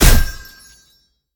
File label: projectile hit; smite; tiamat